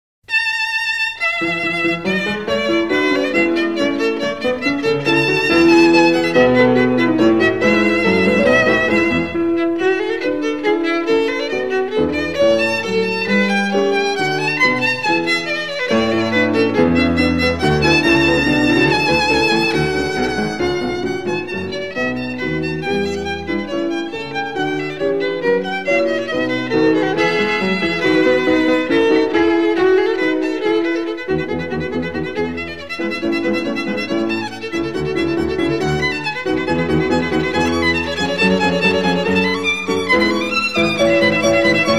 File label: Violin, A Major, Allegro; Suzuki Violin Method; Sonata N1; G.F. Handel